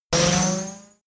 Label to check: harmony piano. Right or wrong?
Wrong